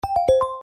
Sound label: Key tone end; T390K Wine 3G; LG